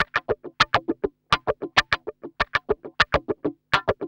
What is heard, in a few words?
GUI, LICKS